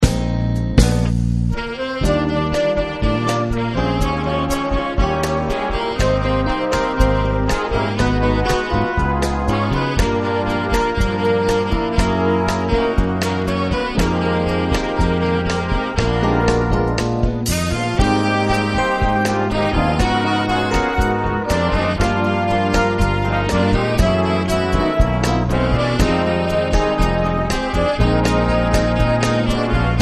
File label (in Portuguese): orquestra bossa jazz